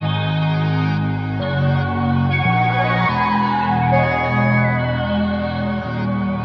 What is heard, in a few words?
keys, Loops, Sad